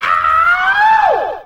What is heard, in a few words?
ahow; ooh ahh